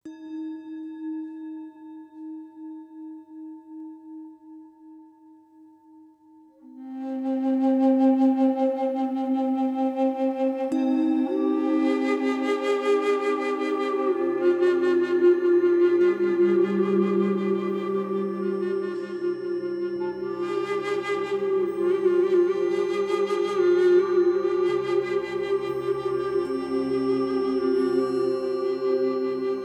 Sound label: Love Frequency Meditation